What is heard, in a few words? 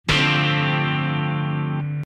Chord Tom